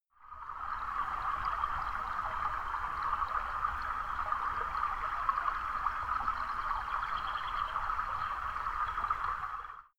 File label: stream